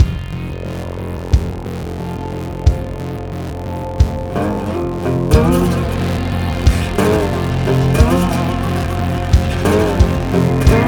Loading Glades; battlefield hardline

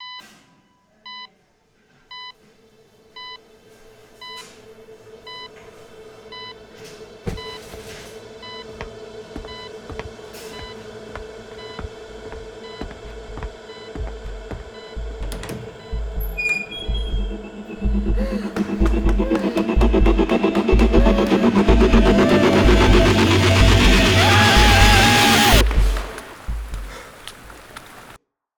Car Aftermath

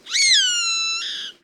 hawk; CS Level3

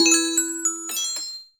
Jingle Item Get; Disney Fairies Tinker Bell and the Lost Treasure; Nintendo DS

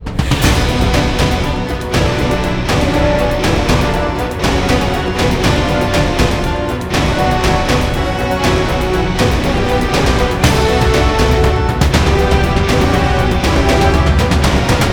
Action Short; Emergency 5

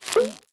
Gift Drop; Candy Crush Soda

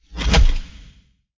powerup tunnel; Golf Blitz 1.12

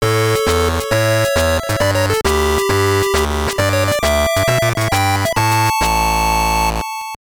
Round Victory; serpent 1990 gb

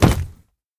drop battery; ice age scrats nutty adventure

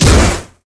Break door

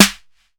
snare

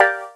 zinger; Zombie Rollerz Pinball Heroes

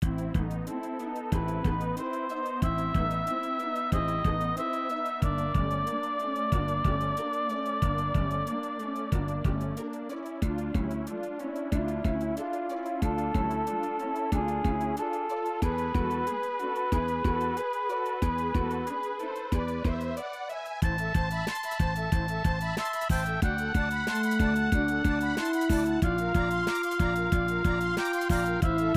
Cave; Dual Orb; SNES

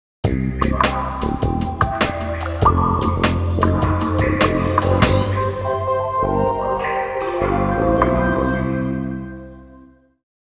Cave Zone Completion; frogger he s back

